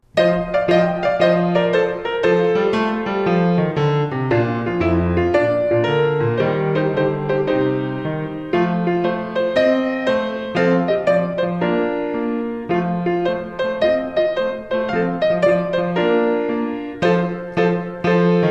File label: theme song instrumental